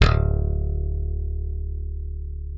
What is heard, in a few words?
D1, slap thumb; Xsample VOL-1